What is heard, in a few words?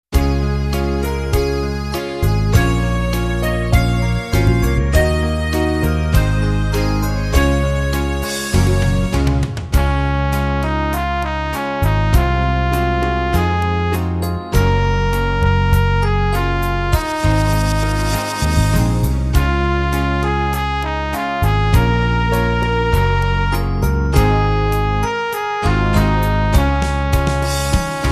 music